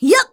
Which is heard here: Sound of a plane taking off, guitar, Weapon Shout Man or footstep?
Weapon Shout Man